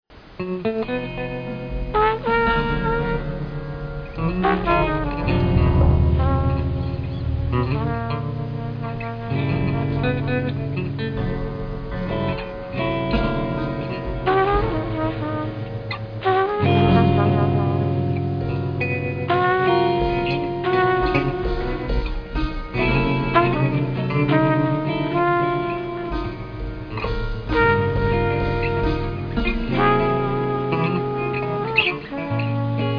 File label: horn